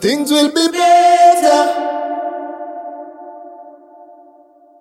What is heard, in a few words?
ringtone reverb